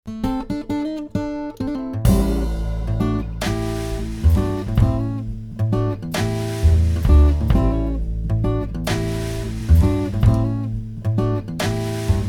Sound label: Tub Thumper Blues